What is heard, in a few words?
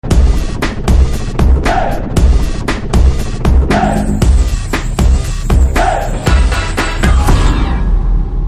Theme Telugu Ringtone; Maharshi